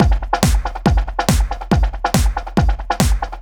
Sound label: Kick Loop, 140bpm